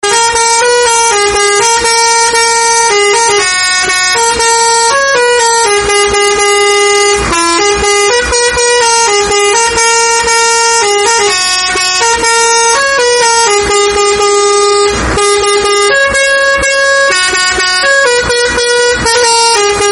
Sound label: bus horn